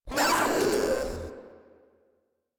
Proto Infested pain; Warframe; InfestedNinetyNine

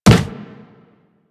Walk Wood, footsteps; Warframe; Operator

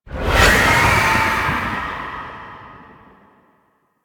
Apparition Release; Warframe; Dagath

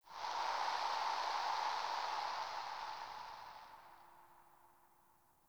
Corrupted Ode Wind Gust Toxic; Ambience; One Offs

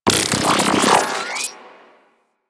Shockwave Electrical Aura; Warframe; Venus; Venus Terra Shockwave Moa; Abilities

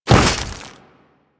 Step Ice, Footsteps; Warframe; Grineer

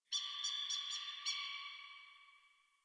Tree Distant Critters Happy Calm, Ambience; Warframe; Duviri

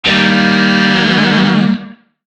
Shawzin Blues Chord; Warframe; Lizzie Shawzin

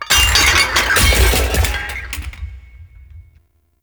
Necramech Ranged Death Fall; Enemies; Warframe

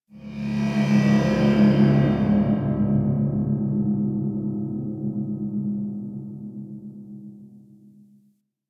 Low Ship Rand Amb; Ambience; Warframe; Grineer Galleon

